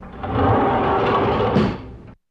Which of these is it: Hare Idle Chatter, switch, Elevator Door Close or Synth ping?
Elevator Door Close